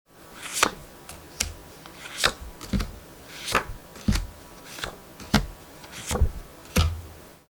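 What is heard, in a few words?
mekuru oto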